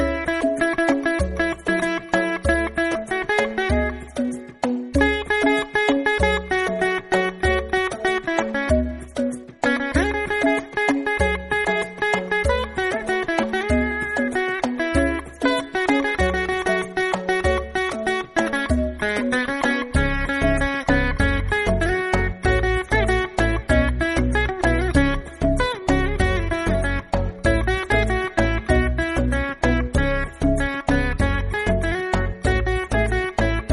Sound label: Veena (Instrument), Ringtone